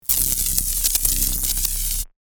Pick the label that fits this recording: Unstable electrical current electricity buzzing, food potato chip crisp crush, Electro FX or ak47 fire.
Unstable electrical current electricity buzzing